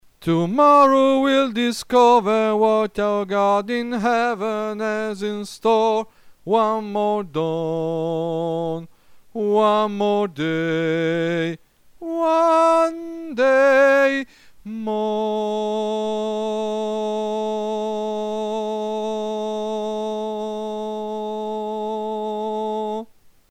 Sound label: BAS